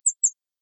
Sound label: example chirp playback call